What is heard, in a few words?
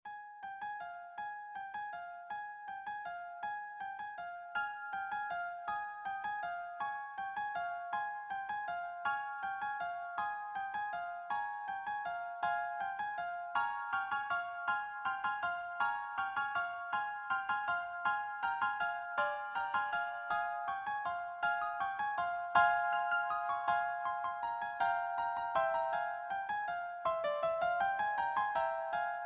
Carol Of The Bells, handbell